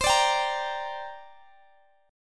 C 7th Suspended 2nd, C, down, Guitar, Open D

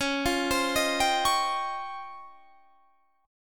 C sharp Major Sharp 11th, Guitar Standard; 21,20,22,20,20,21; C#M#11 arpeggiated; capo=3

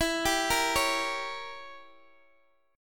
E Diminished 7th E down Guitar DADGAD

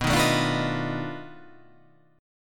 B 7th Sharp 9th Flat 5th B down

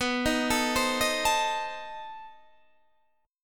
B 7th Flat 9th B Guitar Open D

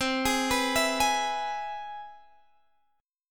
C Augmented Major 7th, Guitar, Drop D

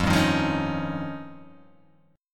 F 7th Suspended 2nd Sharp 5th

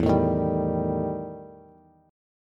E Minor Major 7th Double Flat 5th E Bass; strummed; JGuitar

